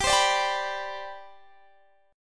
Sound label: C Major 7th Suspended 2nd G, chord